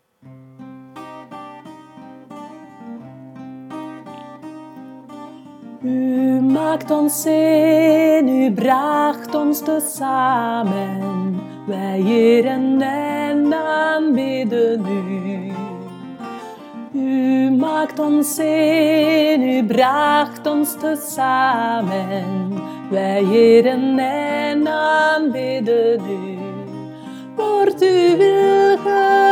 alto

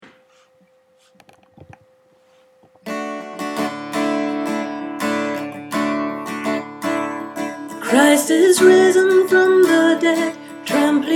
christ is risen soprano

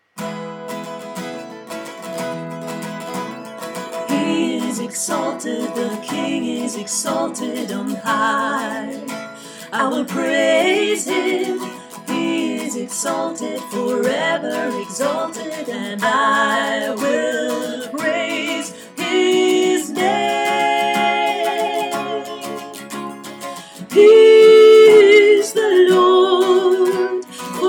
soprano

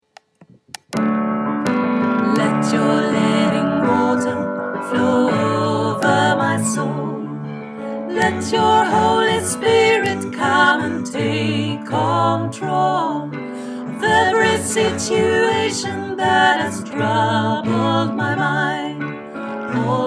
tutti

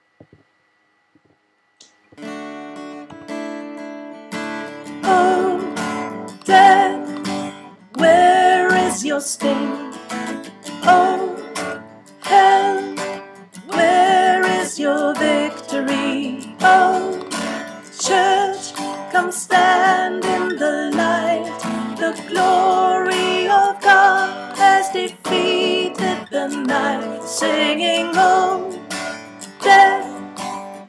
alto

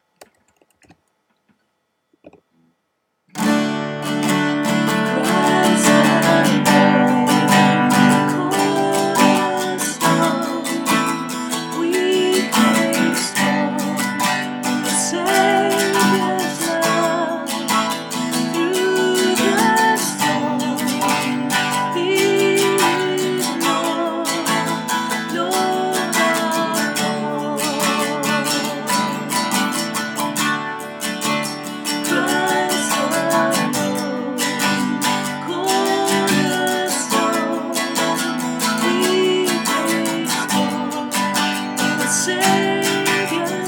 tenor

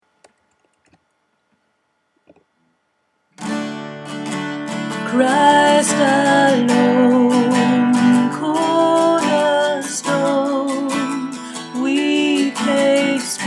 alto

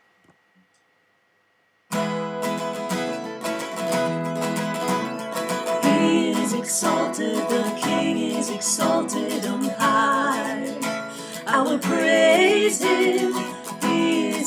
tutti

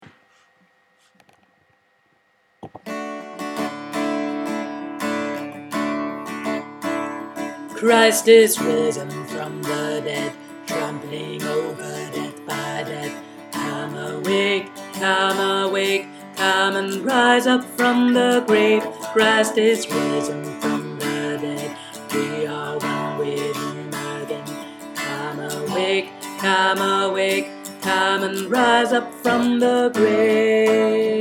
christ is risen bass

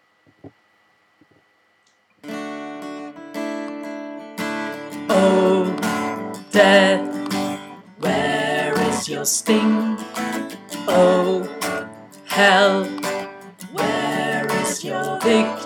christ is risen bass

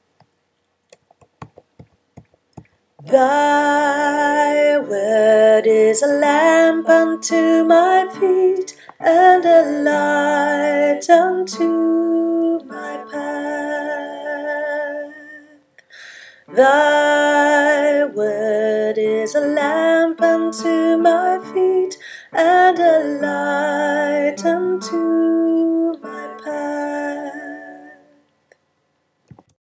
soprano

